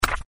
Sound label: switch Hero